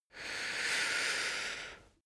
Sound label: male breath slow in